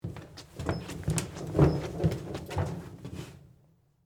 Bleachers Walk, Footsteps, Across, Aisle, Fast, Metal; BlastWave